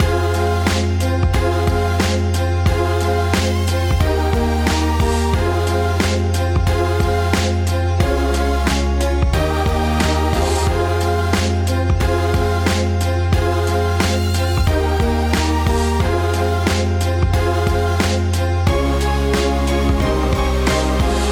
90s Sad School

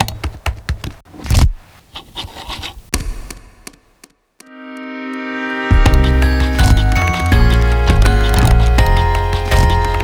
typing

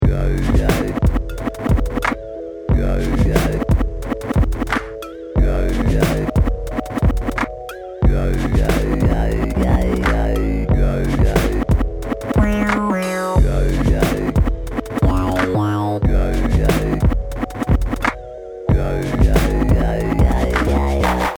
funk

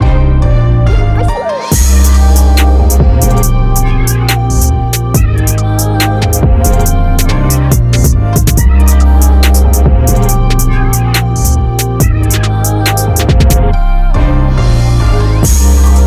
UK Drill typebeat

